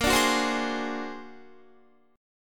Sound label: Bb Augmented 9th Bb, Guitar; Bb+9 strummed; JGuitar